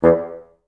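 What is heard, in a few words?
staccato E1, Advanced Orchestra; Best Service; Peter Siedlaczek's Advanced Orchestra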